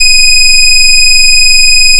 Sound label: fm cello single; FFTimbre